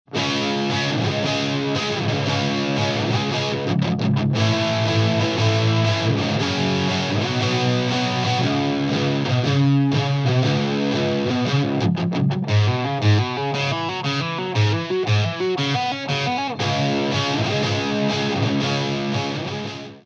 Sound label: Electric Guitar Buss Unprocessed